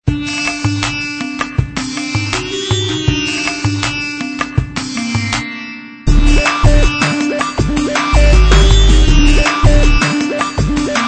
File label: TECHNO SITAR; Axioo M5C; ringtones